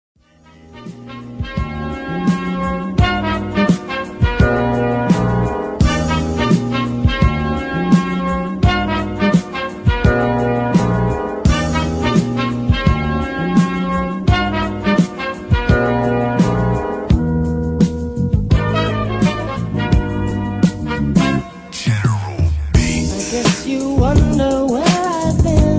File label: instrumental